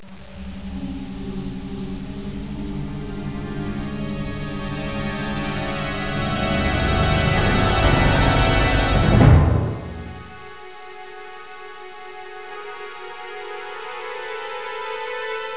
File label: Song